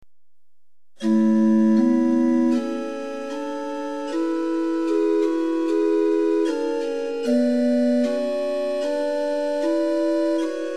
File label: Liturgical Organ Pitch 440 Temperament Equal Rohr F8; LiturgicalOrganBooks